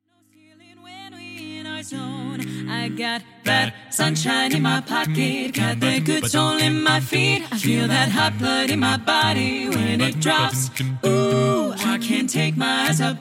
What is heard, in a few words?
SATB